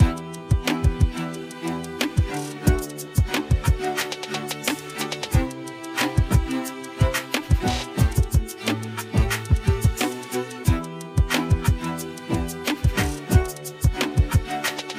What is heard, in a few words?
beat